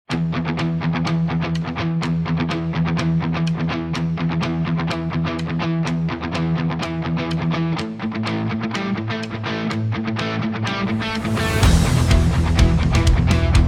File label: Guitar